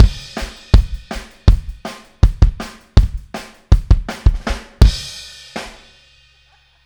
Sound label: Outro Kick, 162 BPM, Drums; Drums from the Big Room The Mixes; Take Your Toms Off